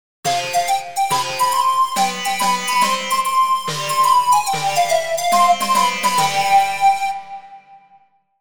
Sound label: Okinawa C scale